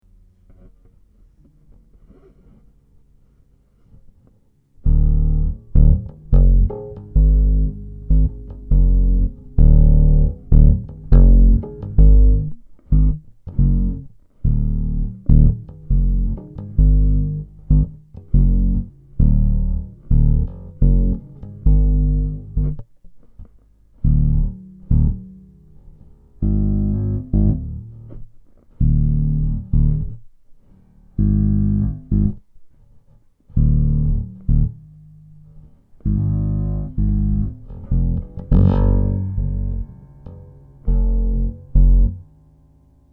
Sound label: Ibanez Gio 4-String Bass